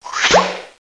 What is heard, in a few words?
Pop; The Pea; SoftKey